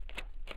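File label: gritty cement shuffle, footsteps; chief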